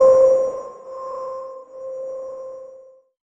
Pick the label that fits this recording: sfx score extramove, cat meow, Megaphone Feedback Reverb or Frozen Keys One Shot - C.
Frozen Keys One Shot - C